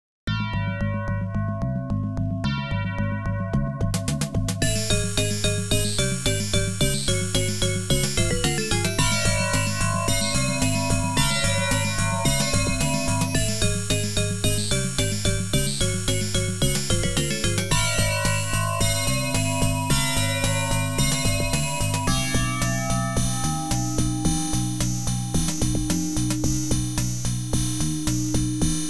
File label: OPL2